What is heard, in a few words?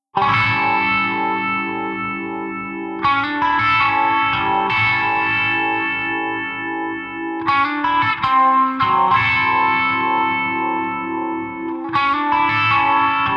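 Blues Guitar Breakdown; Digidesign; Pro Tools Essential Loops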